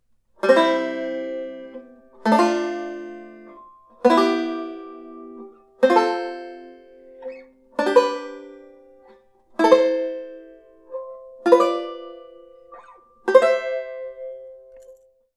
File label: 2nd Triad Scale; Beyond Chord Melody Volume II